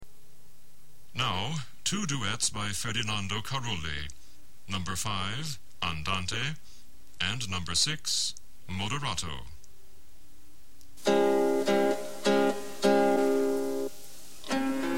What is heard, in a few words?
andante